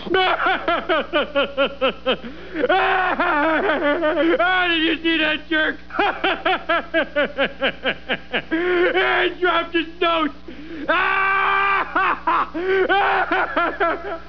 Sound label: Horse laugh; Homer